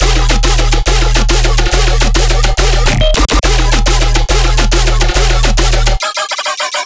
bass loop triplet 140 D#; tripletkilla; Virtual Riot

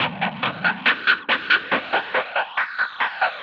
perc 140 steam, Percussive Loops 140 bpm

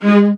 VIOLAS A#3, Synclavier Strings; ILIO

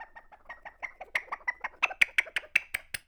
CHIMP, BIRD CALLS; Voice Crystal - Steve Reid Definitive Percussion

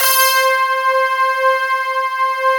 ROCKIN C5, Synth; ROCKWIRE

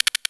Busy Clicker, Drums & Percussion; Percussion Fills; FX; Sonic Foundry (Sony Creative Software); Futurist Drum & Bass

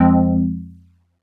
stab grim, stabs

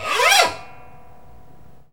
WIND SOUN

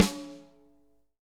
SNARES DRY; Real Mega Drums

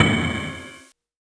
Perc Matsuri Casio SA-1

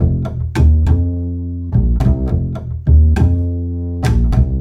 Acoustic Bass

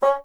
WND BSN, Bassoon

